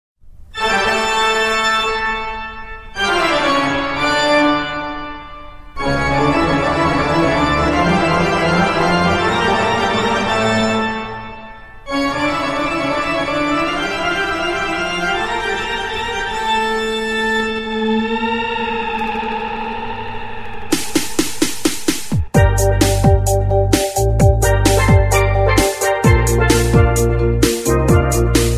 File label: piano scary hallowen